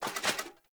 Foley Dual Dagger Taunt; Raider; Sinecure; Enemy

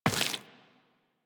Step Flesh, Footsteps Walk; DE; Warframe; Tenno

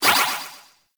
Alchemist Transmute Ability Pickup Convert; Warframe; DE; Warframes

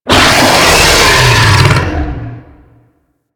Infested Bone Blade Vocal C; Warframe

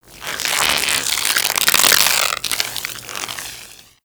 Shock Swarm Projectile Random; Warframe; Infested; Shock Swarm Rifle

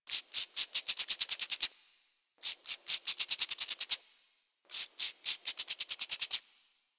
Forest Insect Rattle Short; Warframe; Ambience; Grineer Forest

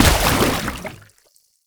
Step Water, Footsteps

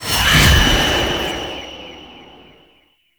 Wraith Possess; Enemies; Warframe; Zariman

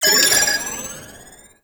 Drone Defense Start; Warframe; Nokko Colony

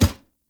Heavy Grunt Boot Walk Wood, Footsteps; Characters; Enemy; Sinecure

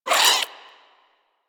vocal attack, critters; Warframe; Hunting Critters; Nexifera

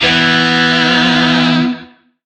Shawzin Chromatic Chord H; Warframe; Lizzie Shawzin

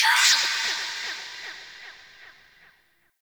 Hallow Spirit Vocal Death; Halloween

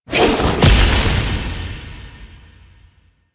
Stance Two Cast; Warframe; Teshin; Powers

